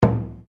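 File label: Drum Taiko forte, Japanese Taiko Drum; Miscellaneous Musical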